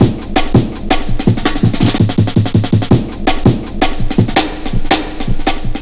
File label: 165, drum loops, drum & bass; 90 s Sample CDs; Total Drum&Bass